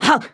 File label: kiai; MonsterHunter; Fight